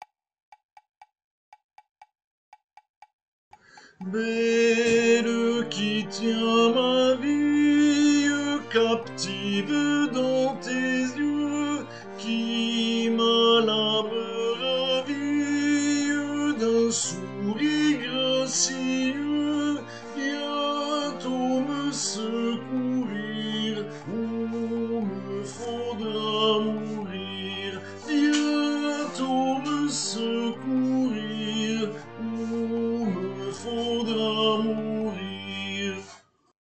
Tenors 1er couplet; voix chantée; Pavane

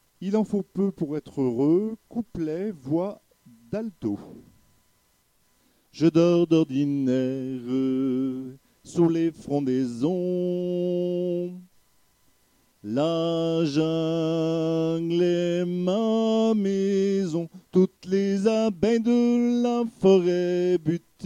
Couplet voix d Alto

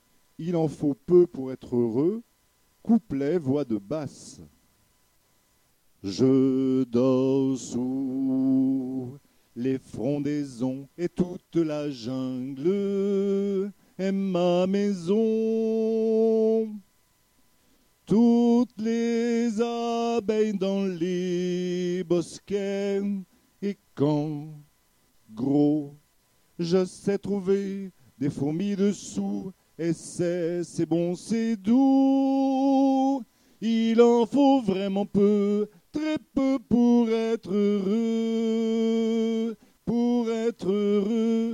Couplet voix de Basse

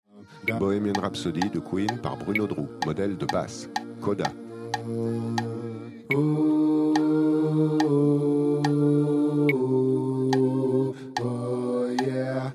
Basse, Coda

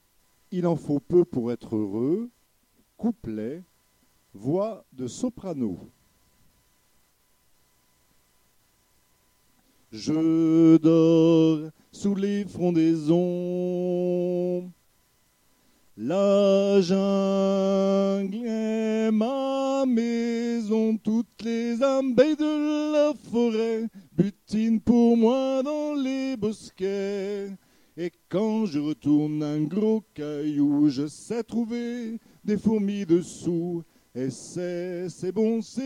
Couplet voix de Soprano

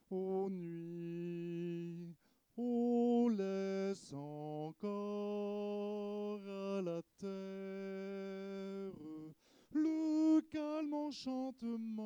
La nuit Soprano solo voix seule

